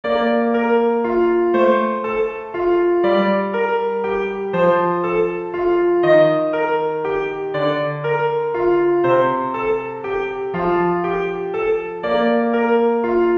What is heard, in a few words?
piano